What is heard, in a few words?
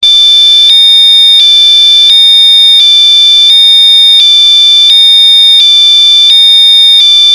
Ambulance Sound